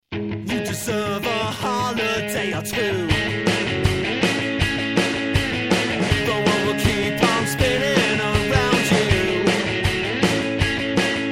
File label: solo; broken solo